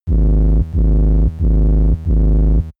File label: pulsing device